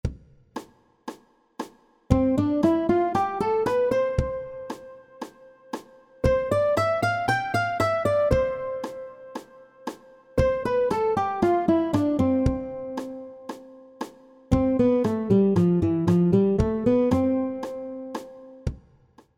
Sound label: scale